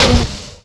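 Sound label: water slap, Splash; Splashdown; XBOX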